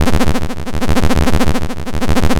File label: LFO, Noise